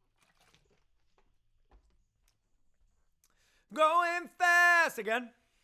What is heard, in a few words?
LV Choru